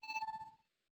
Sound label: Rune Deactivate